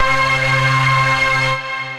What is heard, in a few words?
Juiced Saws, Synth Lead